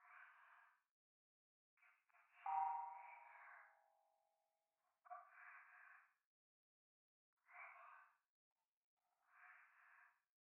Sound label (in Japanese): 左耳 密着 処女 喘ぎ 寺; 夢の中